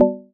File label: Menu Navigation; Emberfall